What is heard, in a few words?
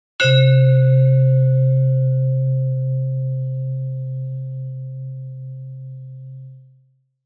bell sound; Mindboost